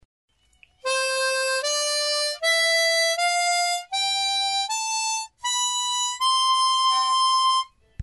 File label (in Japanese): クロマチックハーモニカ C メジャースケール